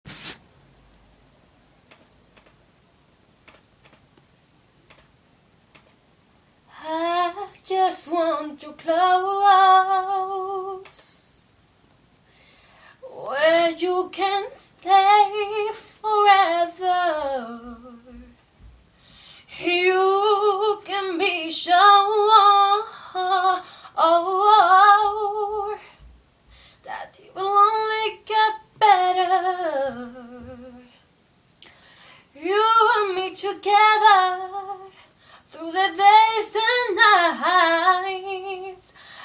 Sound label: singing